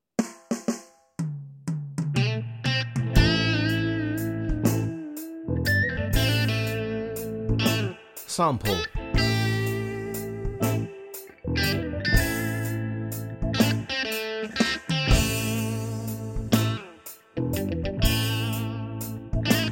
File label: Smoky Blues